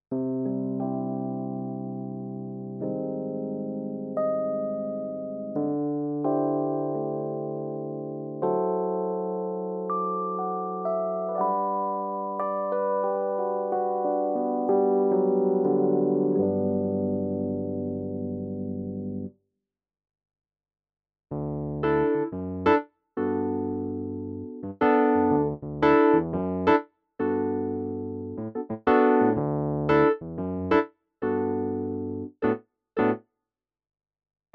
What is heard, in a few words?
PX5S EPiano